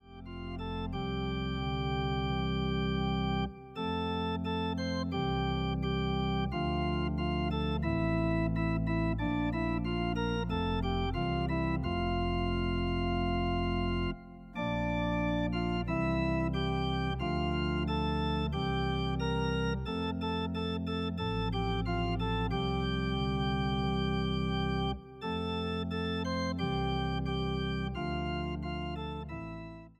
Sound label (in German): Irisches Segenslied zum Mitsingen zur Orgelbegleit